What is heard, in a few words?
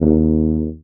Contrabass Tuba Straight D#1; DirectWave; Varazdin Orchestral